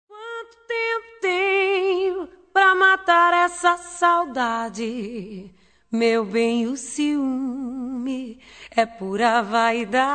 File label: vocal, chanteuses; Découvrir les brésiliennes